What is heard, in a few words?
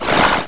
Teleport; Cube